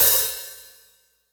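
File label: Open Hihat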